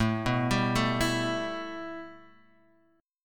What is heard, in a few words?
A 7th Suspended 2nd A, Guitar Half Step Down